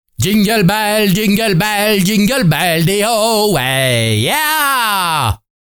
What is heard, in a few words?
Jingle bells yeeeeaaaaah !, ACAPELLA; pack noel